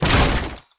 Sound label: cannon hit; pirates